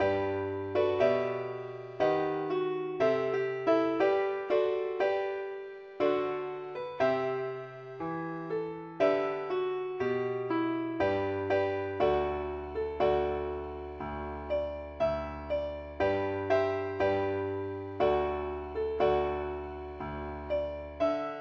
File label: ukelele key g; pilot